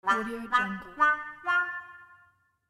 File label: duck quack